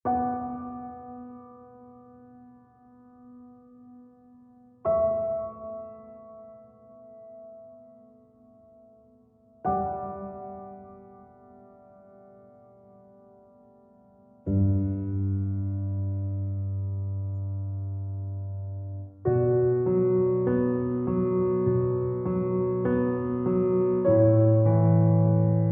Solo Piano

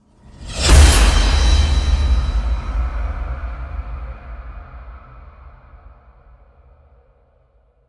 Impacts Demolition; Gothic Storm Music; Advanced Sound Design 2012

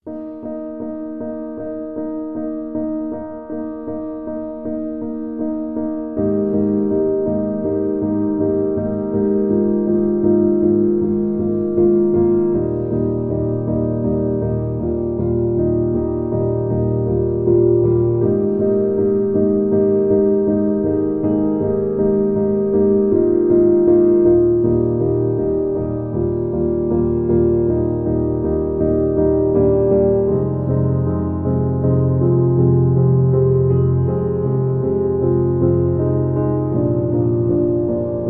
Sad Piano Songs

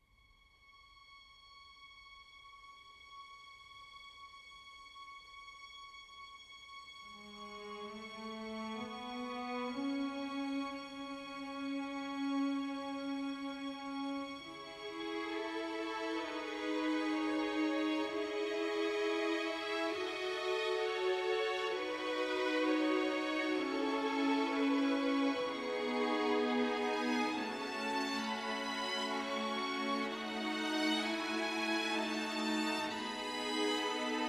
Letters (Orchestral); Fired Earth Music

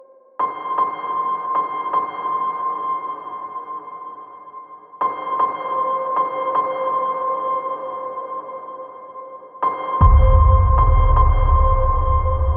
Shimmer; Ninja Tracks

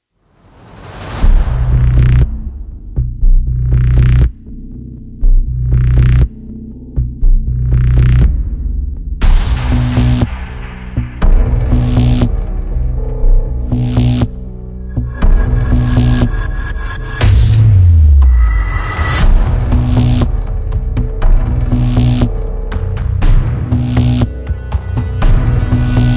Long Percussion Mix; Ninja Tracks

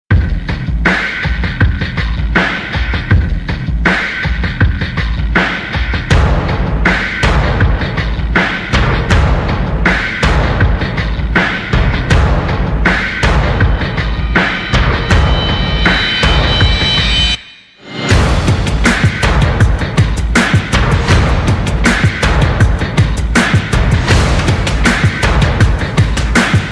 Drums

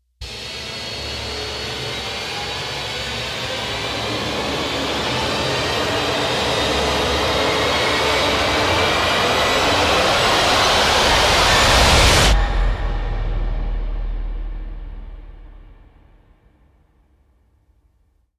Rise; Ninja Tracks

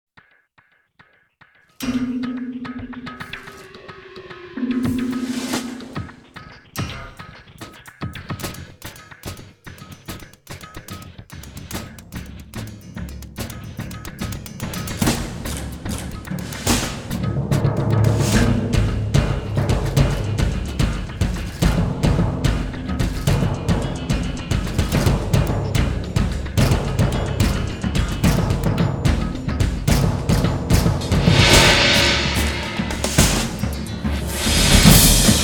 Drums Only Version

